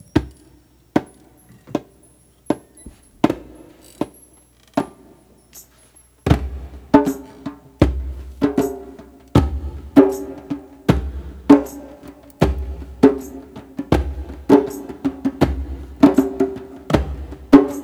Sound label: Shaman Beat